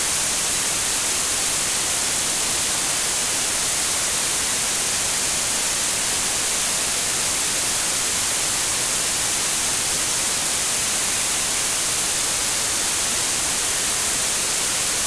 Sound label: White Noise